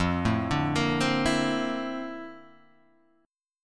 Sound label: Bb Major Sharp 11th F, chord